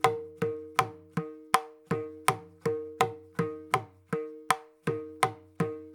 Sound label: Mridangam Sarvalaghu Phrase